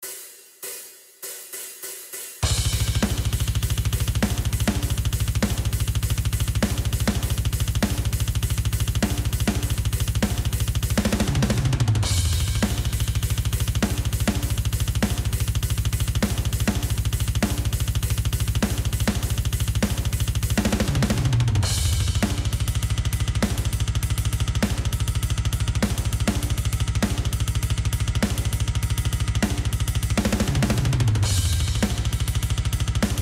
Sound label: death metal etude reverse gallop